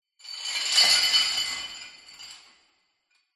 horror chain shackles drop dungeon prison reverberant